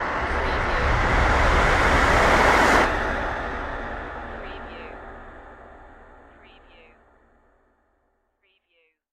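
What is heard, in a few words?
Production Element Reverse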